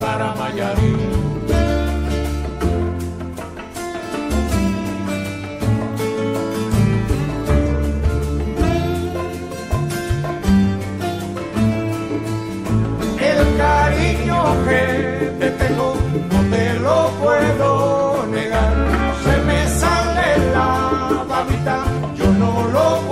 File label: colombian wedding ringtone